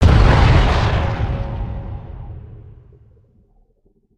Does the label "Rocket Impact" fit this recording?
Yes